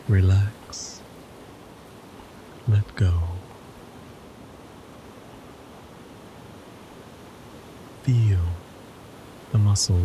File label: Big Ocean Waves on Rocks; Progressive Relaxation